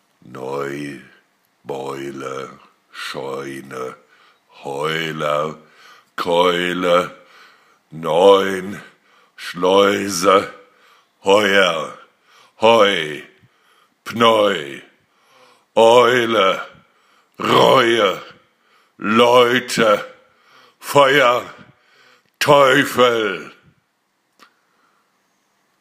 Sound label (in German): Stimmübungen Klangräume